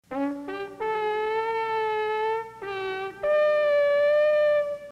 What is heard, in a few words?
sad trumpets